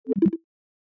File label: voiceRecordingStopped